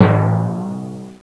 timpani

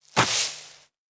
cut stalk; Immortal

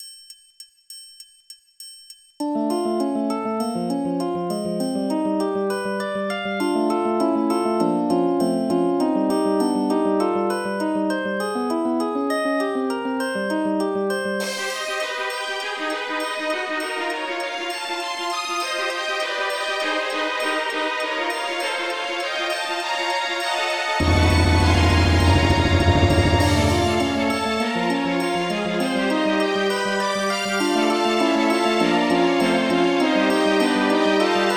bach attach; aargon deluxe

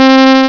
Sawtooth

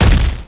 FIRE HIT; Exile III v1.0

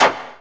menu command; quake 2

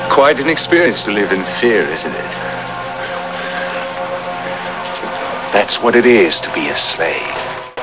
Start Windows

